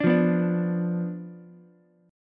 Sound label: B Diminished B down Ukulele; Bdim strummed